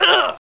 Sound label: ouch; Renaissance